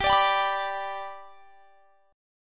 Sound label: G Major down; strummed